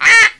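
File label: duck; ente; tiere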